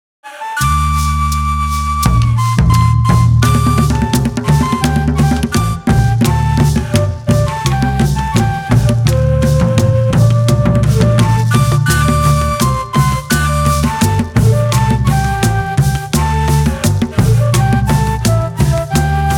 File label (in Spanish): tambores y gaitas; tribe instruments